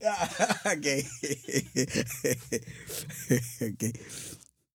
LAUGH, Vocal Jazz Fx

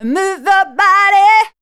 voice spectral, ly short

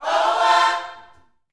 YOUTH CH PHRASE, Vocal Planet Gospel

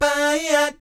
BAYEEAT, Vocal Planet Jazz Fx; Spectrasonics

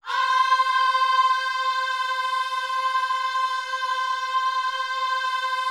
OHS C 5, Gospel; Spectrasonics; Vocal Planet Vol.1 Gospel; akai

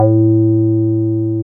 BASS; E-Lab; X Static Goldmine 1

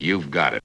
VOX

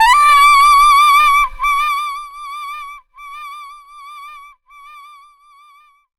SAX RIF

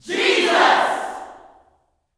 "Jesus", Vocal Gospel yell shout; Spectrasonics; Vocal Planet Vol.1 Gospel; akai

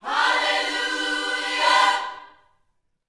Vocal Gospel PHRASE

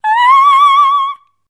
C#6, Voice

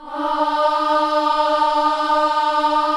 KIDS CHOIR, Gospel; Spectrasonics; Vocal Planet Vol.1 Gospel; akai

